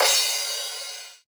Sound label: crash